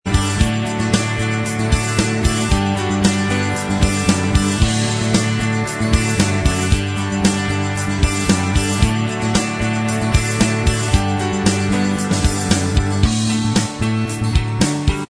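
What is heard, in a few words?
instrumental track, backing tracks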